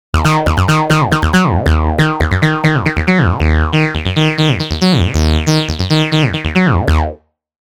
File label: Acid Loop D# 138 BPM; Sylenth1; Paper Trails